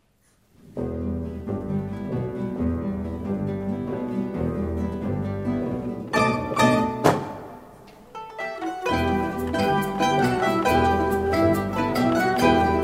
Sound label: rumba